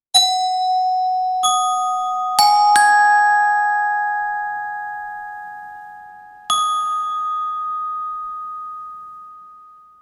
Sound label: glockenspiel